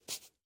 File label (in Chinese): 犰狳环境声